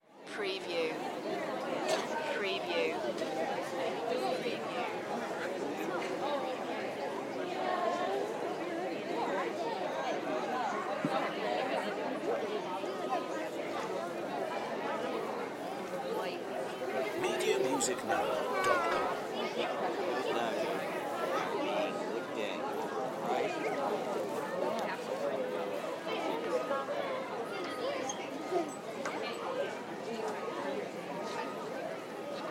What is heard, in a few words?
Fair Grounds Crowd